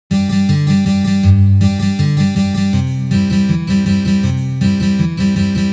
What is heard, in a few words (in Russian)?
Boj Kantri na gitare